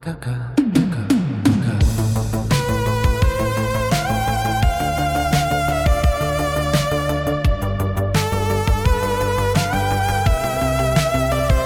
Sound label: electronica